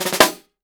snare drum, jungle snare chromatic; Best Service ProSamples vol.43; Real Drum Kits

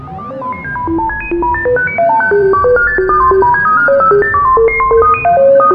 FX SPACE, JV-1080; Syntec; Wall of Sounds

